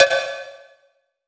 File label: Big Conga